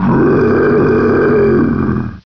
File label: Boss Aggro; Quake